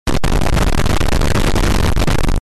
Triggered earrape short, bass boosted

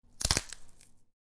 Bone breaking slowly with ligaments stretching

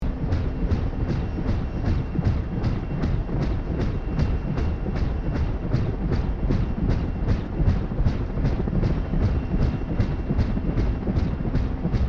Helicopter slow motion loop

